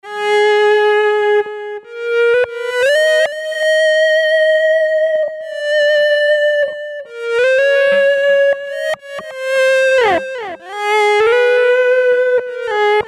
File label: ECHO VIOLIN